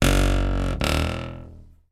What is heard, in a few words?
household door creak wooden hollow